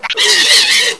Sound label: scream, headcrab poison; hl2